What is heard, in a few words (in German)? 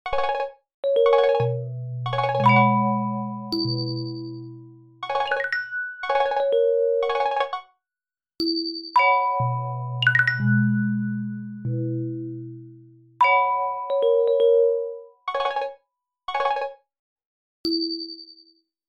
Funktionsklaenge Sounds Raum